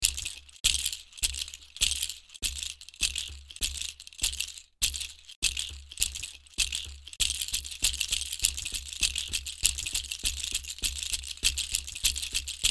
Foot Rattle Percussion One-Shot Samples; pieropeluche

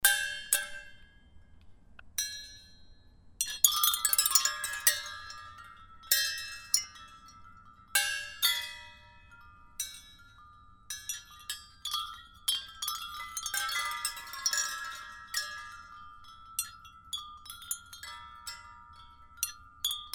chimes sculpture; Ivana Pagan s

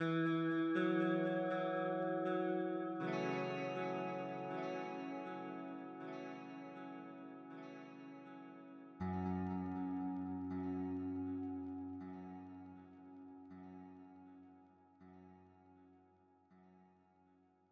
Guitar loop

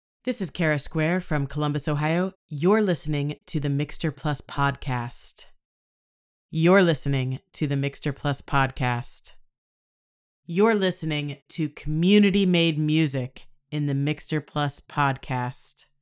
Podcast Bumpers

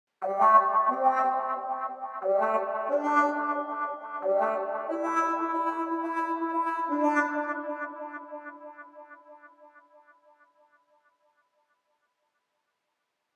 Blues Jazz A minor 120BPM

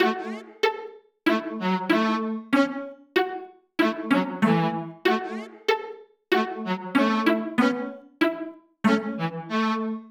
Happy Munchkin Music

